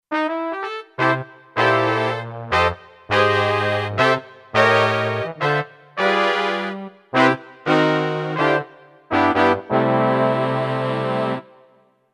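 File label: Dynamic Big Band, Kurzweil K2500X; Factory Programs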